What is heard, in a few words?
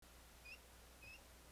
Two Squeaks Amplified, rat